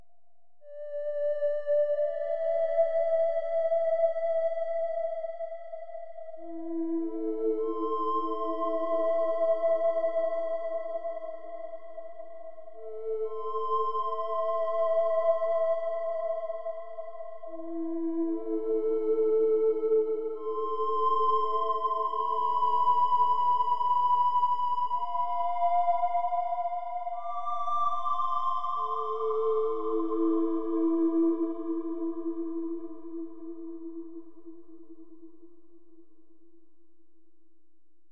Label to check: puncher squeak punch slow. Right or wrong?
Wrong